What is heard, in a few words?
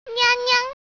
nyannyan; svencoop; votemap